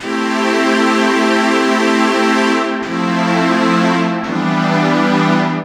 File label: String Loop; Drum & Bass